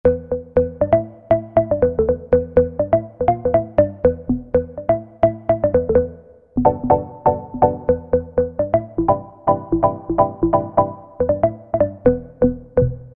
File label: Organ Tong; Dance; Roland SR-JV80-06